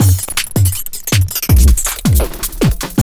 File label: JUNGL 160, JUNGLE LOOP